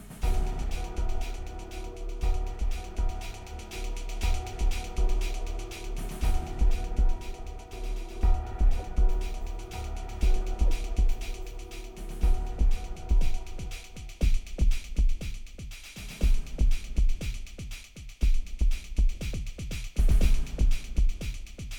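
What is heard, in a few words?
Refinery Drum one shot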